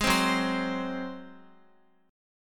Gb Suspended 2nd Flat 5th Guitar 4E,4A,5D,5G,5C,6F; Gbsus2b5 strummed; x,x,6,5,6,7